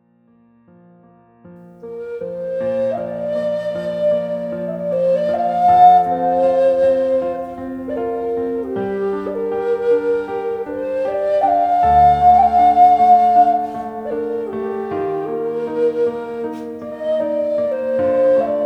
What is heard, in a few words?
indian flute